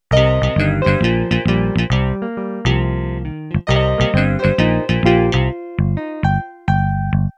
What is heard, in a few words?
Country Shuffle Intro